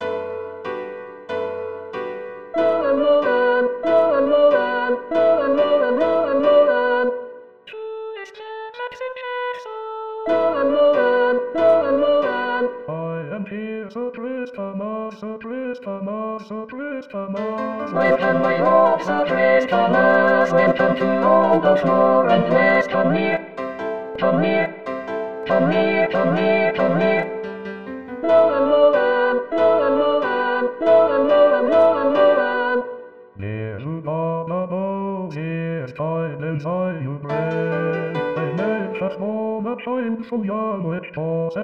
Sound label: Sir Christemas All; Mathias; 2021 Xmas